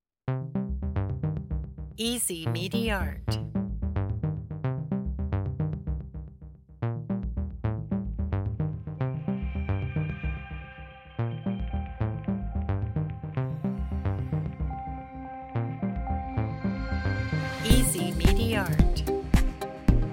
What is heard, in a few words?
paranoid